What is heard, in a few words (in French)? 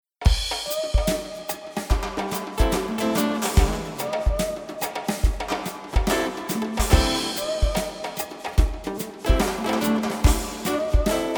Club de jazz